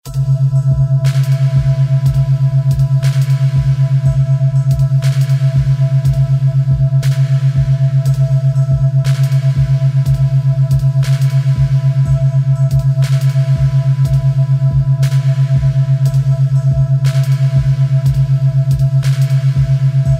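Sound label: Binaural Beats, Relaxation Brain Entrainment with Music; Sleepora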